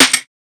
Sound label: snare dub; one shots; SEISMIC Drum Sample Pack; Slate